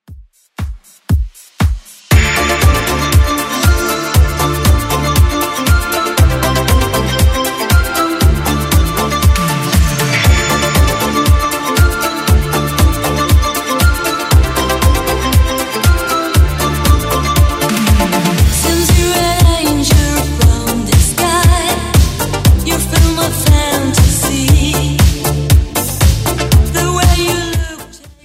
80s Redrum, 118bpm

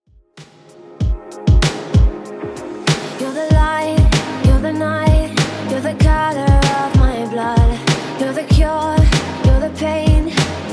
96bpm

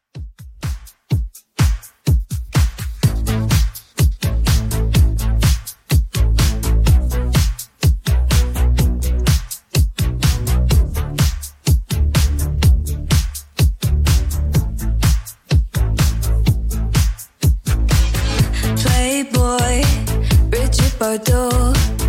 Top40 Redrum 125bpm

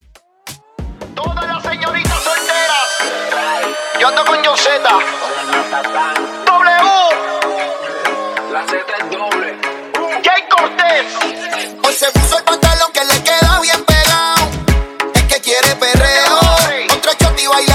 Latino Dance Hall Re Drum 95 BPM

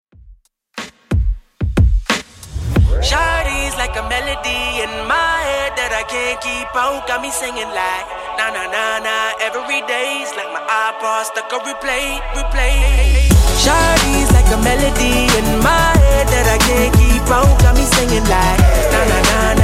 Rnb Redrum 91bpm

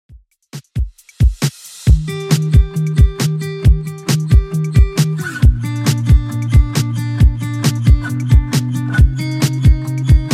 Top40 Redrum 135bpm

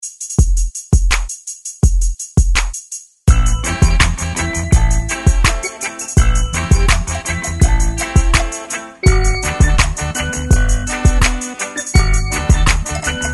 70s 83 Bpm; DJDOLLS; ReDrum

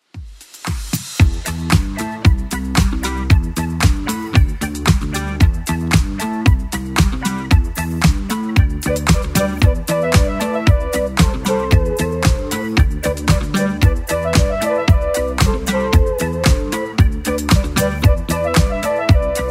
80s Club Edit 114bpm

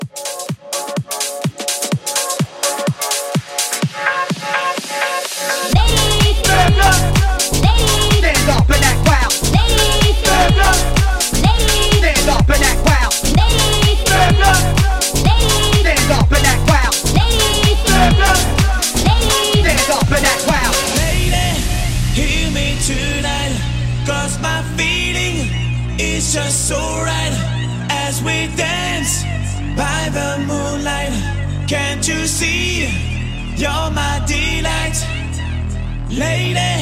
House Vocal Hype 126

